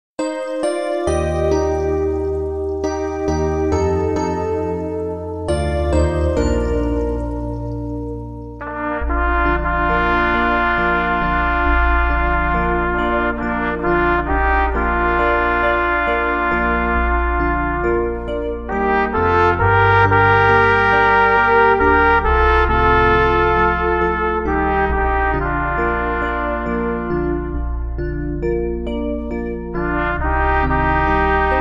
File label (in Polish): maria sliczna pani 2 trabki